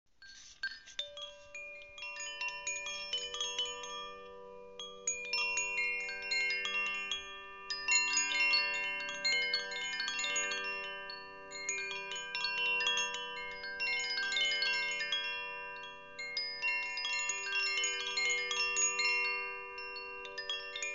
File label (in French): carillon; carillon energetique clarte; frost